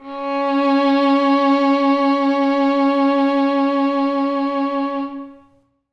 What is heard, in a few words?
violin c#4; Sonatina Symphonic Orchestra